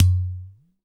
UDU DRUM; AKAI S6000 CD-ROM - Volume 5

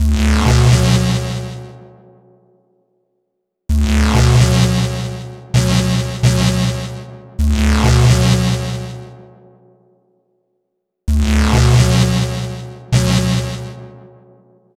Noisy Gate, Synths; Sonic Foundry (Sony Creative Software); Modular Electronica

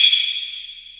JD800 Internal Drums, Roland JD800

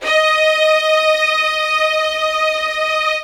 LD2 SUS D#5, LOUD VIOLINS; Q Up Arts; Denny Jaeger Master Violin Library

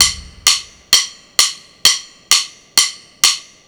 hats, drums acoustic, 130bpm, metal drum; musicradar-metal-drum-samples

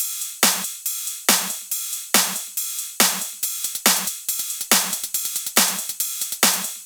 drum Loop 140BPM Full

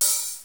Open Hi-Hat, Yamaha DD-7

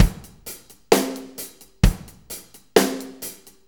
4-4 Loop, Drum Loops, Full Kit Loops; Drum Tools

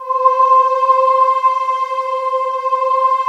K2000 VOX; East West; Global Sounds

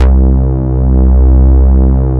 OBXA C3, Synth Bass

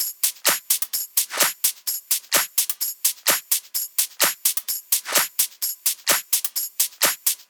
Loops 128BPM, Drumloops

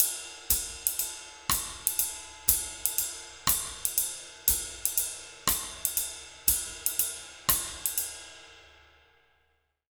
120 BBAND, Jazz Latin Drumloops; USB Soundscan vol.08; AKAI

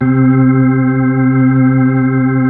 B-3, Keyboards

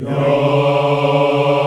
VOX African Yas; Roland LCDP11 Africa VOL-1

